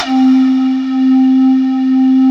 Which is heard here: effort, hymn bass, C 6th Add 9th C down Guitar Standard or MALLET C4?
MALLET C4